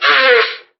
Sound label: fart short